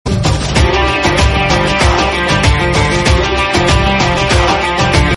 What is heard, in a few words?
sms